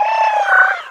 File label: darwin death; darwinia demo